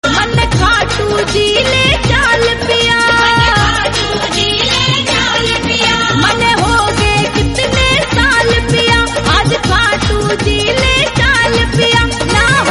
Bhakti Song

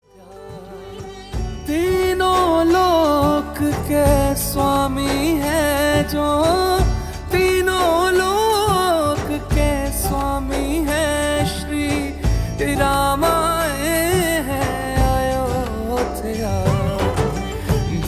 Ram Bhajan Ringtone

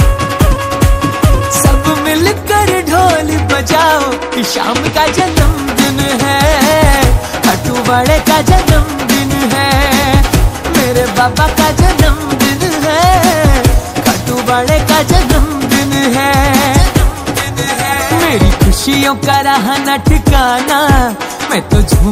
Sab Milkar Dhol Bajho Ki Shyam Ka Janmdin

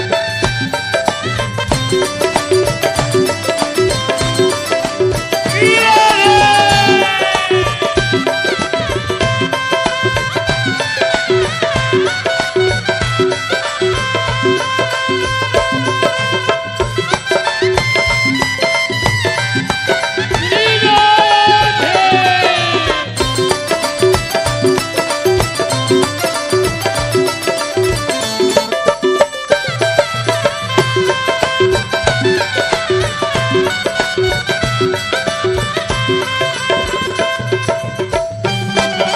Instumental, bhajan

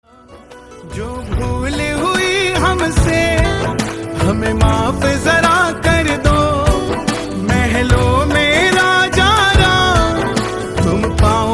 Ram Bhajan

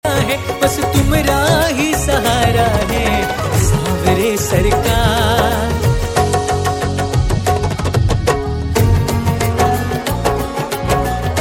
Bass Latest Shyam Bhajan

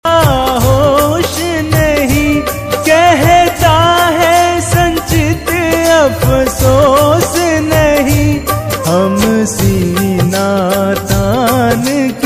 Khatu Shyam Bhajan

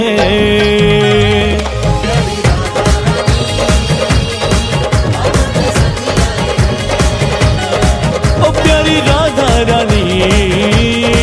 Krishna bhajan; Radha Rani

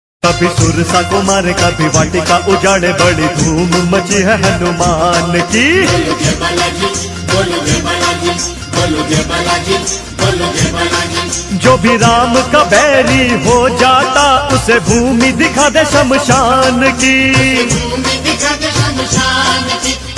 Hanuman ringtone, bajarang bli bhajan

